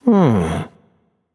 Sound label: thinking; smite; voicepack; osiris